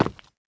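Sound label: player tile, footsteps; csgom; cstrike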